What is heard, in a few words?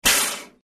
Air Mortar Blast From